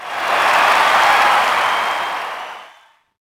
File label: Cheer, Crowd, reactions